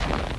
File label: snow